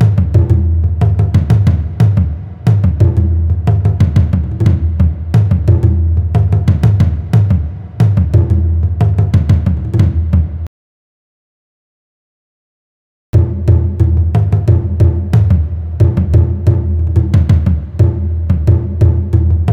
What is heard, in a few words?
Japanese Warrior Drums 90 BPM; Ударные (Drum Loops)